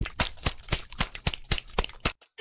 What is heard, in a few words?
sus clap